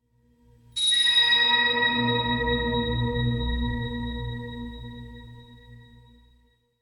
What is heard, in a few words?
High Bell; Warframe; Ambience; Clan Dojo; Dojo Randoms